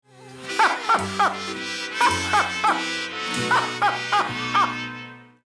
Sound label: ticker laugh; General Ticker